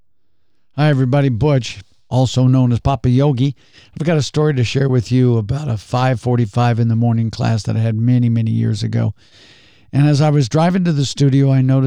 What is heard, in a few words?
Howling at Moon